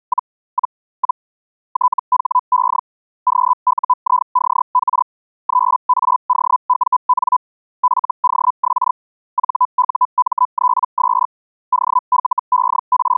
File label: feld hell